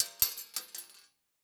Weapon Bullet Shell 3030 Shell Cases Multiple Cases Fall On Metal Ground; AdobeCC